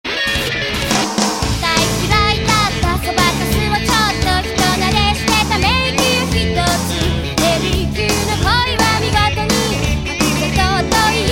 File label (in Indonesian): Nada Dering Anime; Samurai X